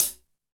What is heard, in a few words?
HH HH, HH Real